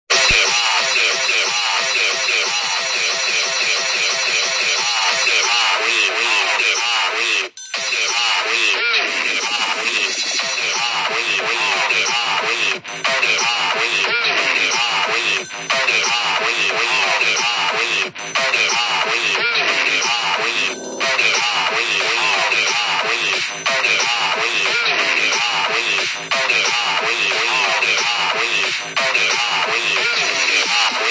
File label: hardstyle trance